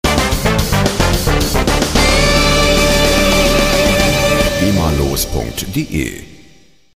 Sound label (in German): rock tusch